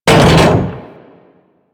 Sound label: Arachnoid Camper Step Metal Debris; Warframe; Corpus